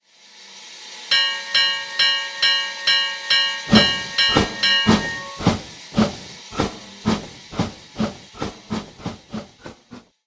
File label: Bell NP 765; RailPro